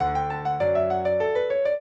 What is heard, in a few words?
trio, piano; Mozart